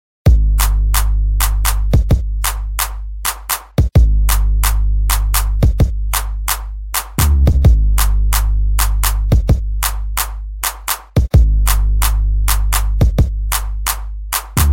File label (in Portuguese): toque baile de favela ringtone